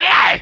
Werewolf Transform Jump

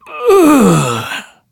hit; cookie run

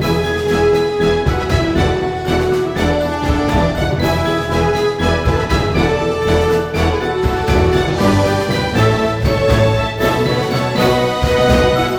Clear Reward BGM; Cookie Run; Jelly War

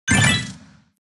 jump; Blue slush cookie; Cookie Run

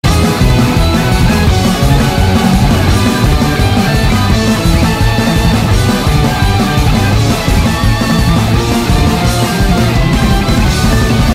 Bgm rockstar new Bonus King; Cookie Run

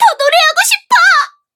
hit; Cookie Run

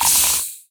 slide

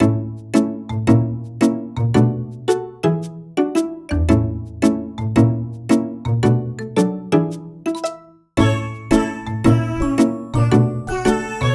Bgm lobby; land01

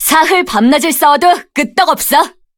levelup; cookie run

